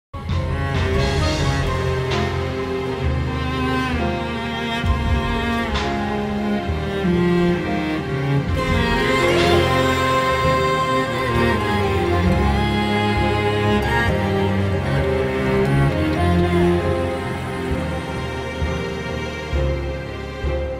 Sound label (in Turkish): Enstrümantal fon müzik; Kadın